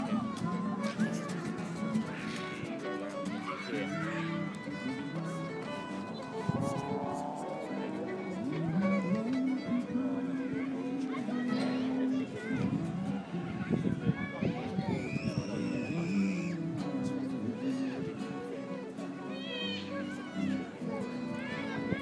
music on the field at folk festival, chaotic soundscape; bromyard folk festival